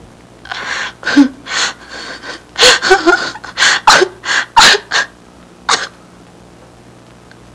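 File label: crying; People; Sound Library 2000; Wayzata Technology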